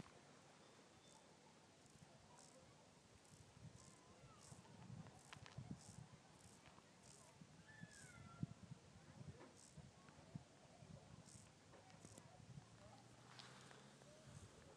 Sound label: crickets